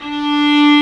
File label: viola, 3-D pads; 3D Multis